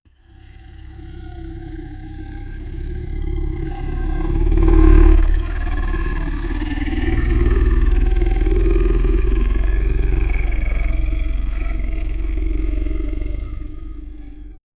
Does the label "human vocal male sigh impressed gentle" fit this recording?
No